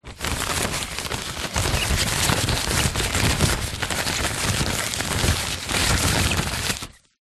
leisure kite nylon small handling

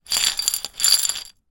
broken glass pieces inside glass jar roll carpet; zapsplat

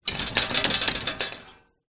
household mirror floor standing shake wobble

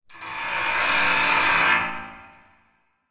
foley metal scrape coil spring industrial; zapsplat

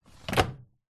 household washing machine drawer tray slide close; zapsplat

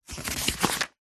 book workbook page turn; household

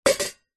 household teapot old metal set down stone surface; zapsplat

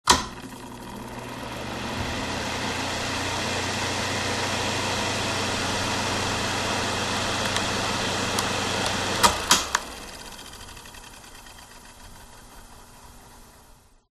ventilator; fox audio

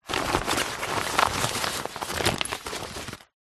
cool bag fabric movement rummage

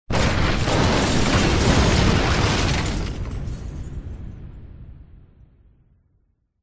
huge explosion; zapsplat

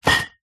bottles beer carton celephane wrapped movement

